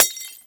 glass break; anemoi

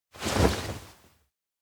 wave; awayuki; anemoi

